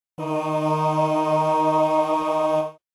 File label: E flat